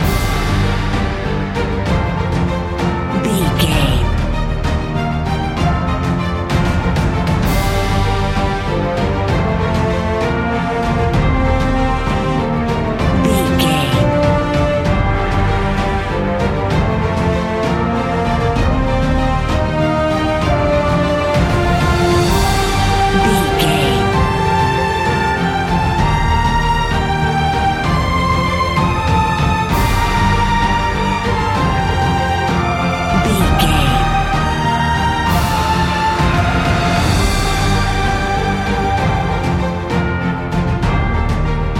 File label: epic cinematic loop